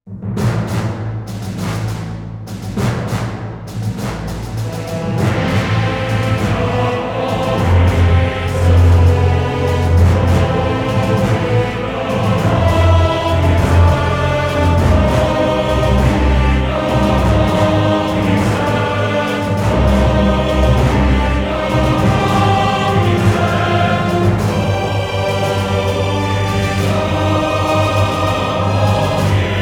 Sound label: 100BPM 4-4; Red Ivan; Evil Genius 2 World Domination; PS4; Xbox Series X/S